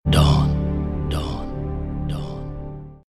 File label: sms, hip hop ringtone